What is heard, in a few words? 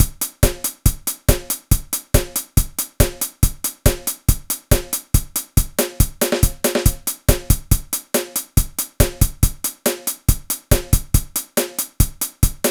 square beat patterns